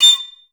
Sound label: STAC A6, Advanced Orchestra; Best Service; Peter Siedlaczek's Advanced Orchestra